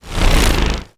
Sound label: small attack; demons; marauder